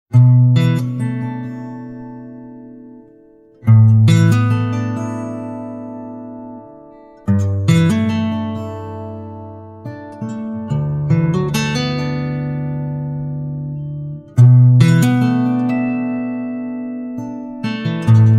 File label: LULLABY